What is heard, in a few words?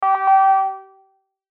chime notice; Chimes; Blastwave